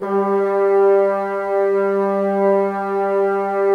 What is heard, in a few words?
BNS G, Bassoons, Wood Winds; Miroslav Vitous - Woodwind Brass Ensemble; Bassoons Links